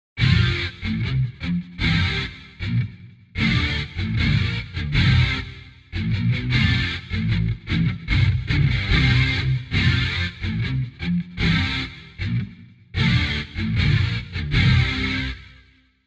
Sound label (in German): symphonisches Gitarren Djingle